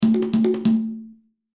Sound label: Hand Drums Accent, Hand Drums Accent Rhythm; Musical; Blastwave